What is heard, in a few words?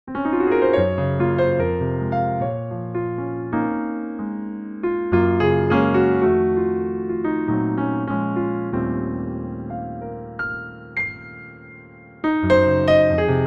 impro, bosendorfer; Mitchel Forman; pianoteq8